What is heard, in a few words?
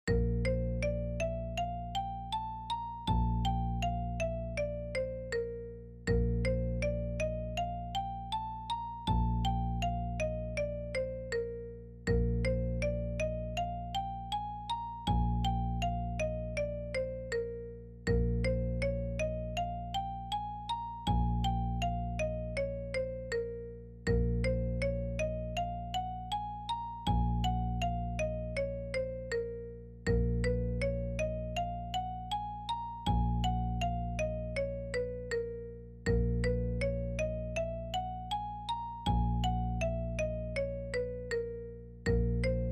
All Scales over pedal slowest